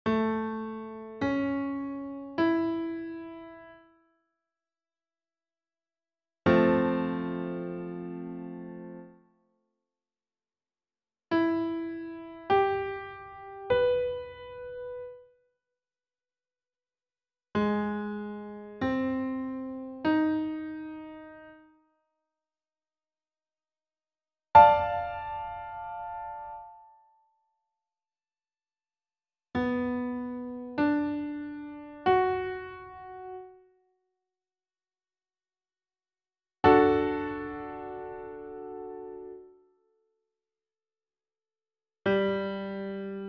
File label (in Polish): Trójdźwięki melodycznie lub harmonicznie